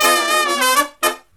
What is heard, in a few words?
Brass Riffs; USB Soundscan vol.29; AKAI